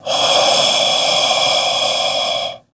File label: Werewolf Breath Airy